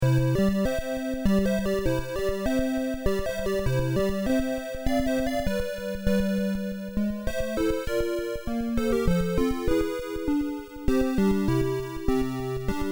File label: fanfare; ultima 4 music; lord british